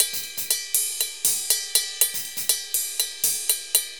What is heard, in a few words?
RIDE, MERENGUE; Best Service ProSamples vol.14; AKAI